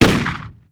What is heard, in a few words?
Gun Fire